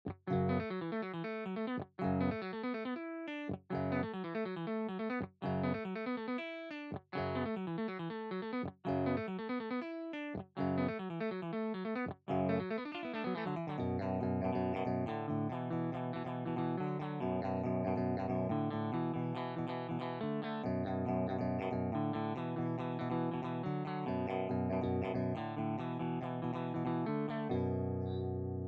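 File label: lespaul fx no distortion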